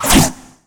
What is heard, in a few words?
scythe swing